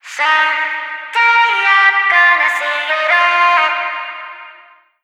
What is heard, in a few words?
Vocal Sample Pack Phrases Eb; Slate Digital Omnivox Vocal Sample Pack